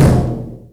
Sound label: Kicks Loud